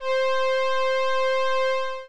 string

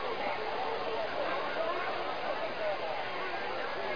people

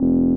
noise

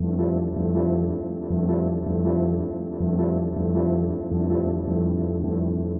synth pad ambient 160 C; Sphere; eJay

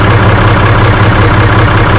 DIESEL; Carmageddon Demo

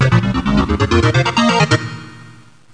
FUNKY

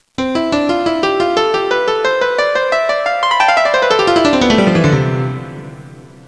PIANO; Sound Library 1; music

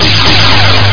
Sound fall

